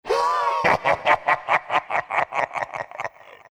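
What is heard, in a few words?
laugh, Chinese; Dota 2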